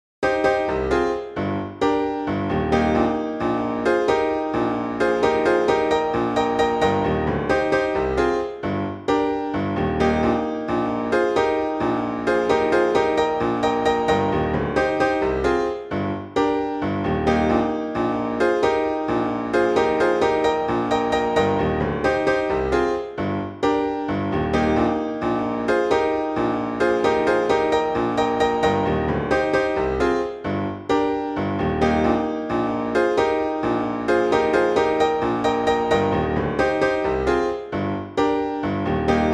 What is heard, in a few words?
miniature in jazz, piano; pieces pour piano